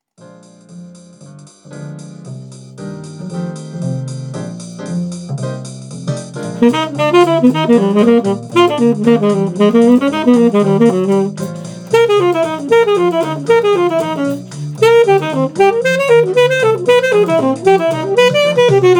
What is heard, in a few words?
Bb blues